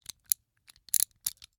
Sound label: Rachet, vanadium steel, 8in, standard, locking, clicking, mechanical, new, socket release, rotate; Eiravaein Works; Vaeyan IV